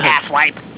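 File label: bass whip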